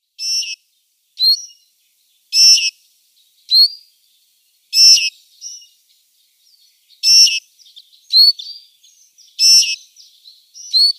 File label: Citril Finch calls